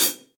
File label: Hihat Multirod Pedal Dry Acoustic; Drum Hits; Standard Hits; Ableton; Factory Packs; Session Drums Studio